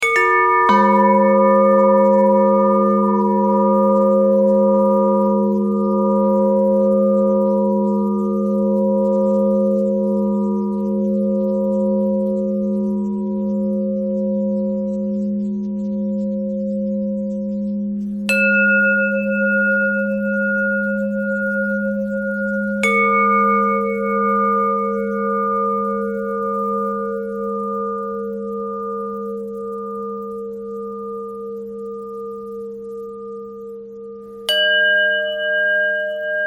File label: bell thread pentatonic